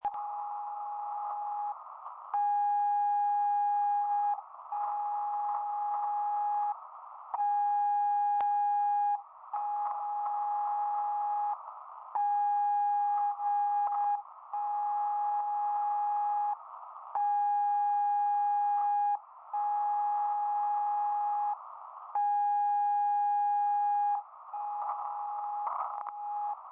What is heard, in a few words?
40m eme